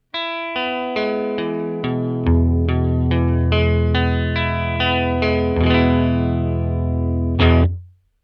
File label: jag neck rhythm, vintage and reissue jaguars; sound samples of vintage and reissue jaguars and jazzmasters